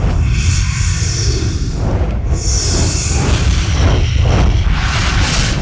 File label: breath loop, rancor; valkyrie npc pack; fallenlogic